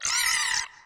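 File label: Mungie death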